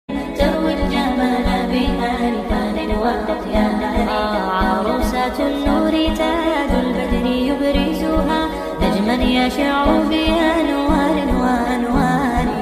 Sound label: wedding nasheed